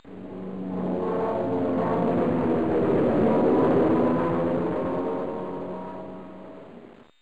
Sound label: PLANE FLYBY, Military Sounds; MineSweeper v3